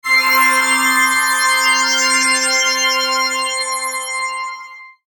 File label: Logo Stab Musical Shimmer; Sonic Logo Sound Effects; Production Element Imaging Element Accent Transition